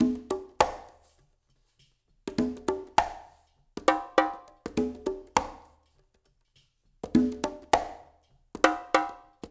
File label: conga bongo, CONGAS BONGO; Spectrasonics; Liquid Grooves 1 & 2